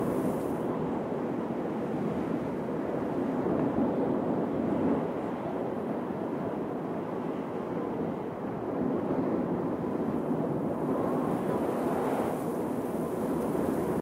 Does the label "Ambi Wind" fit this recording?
Yes